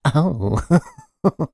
human voice male camp says ohhh followed by giggle